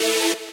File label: saw